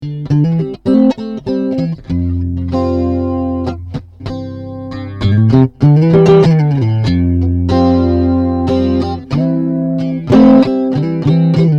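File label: chords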